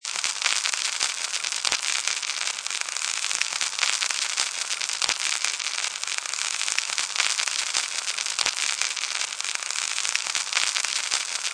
Wick Fuse Burning, Dynamite fuse burning, with crackle; Explosions